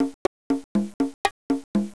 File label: Drum Song; Mythos